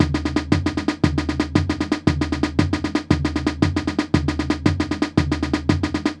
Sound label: Samba Swing